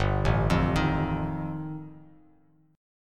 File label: G 7th Suspended 2nd Sharp 5th G, Bass Standard; G7sus2#5 arpeggiated